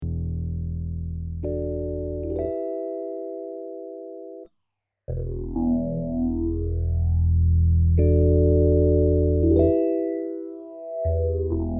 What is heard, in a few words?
Phaser Rhodes; Session